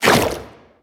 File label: little jump; blastocyst